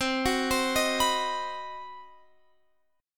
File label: C Minor Major 7th Flat 5th chord, Guitar Open F; CmM7b5; arpeggiated